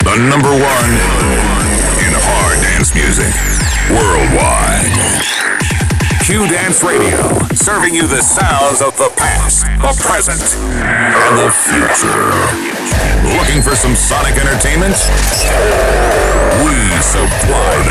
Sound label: RADIO JINGLES